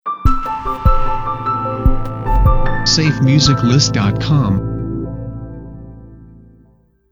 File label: Hi Tech Lounge Video Intro; SafeMusicList